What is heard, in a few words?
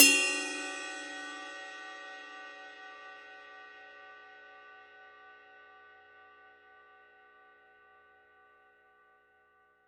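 signature 22 reflector bell ride bell, cymbales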